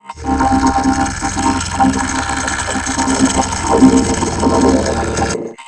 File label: Cave Guardian Final Stretch Hungry; Journey